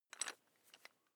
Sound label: electric drill bit fasten wrench turn very fast